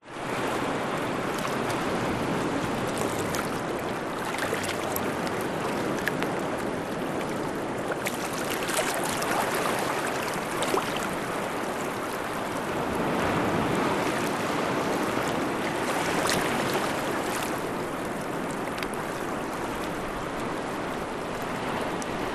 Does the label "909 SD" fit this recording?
No